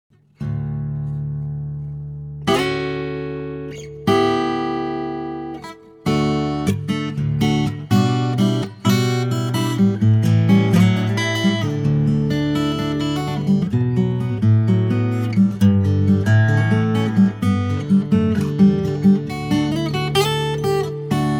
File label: Guitar